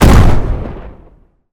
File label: Gun Tank Cannon Turret Shot